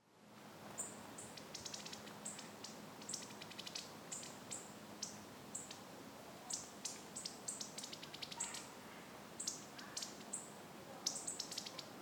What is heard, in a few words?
park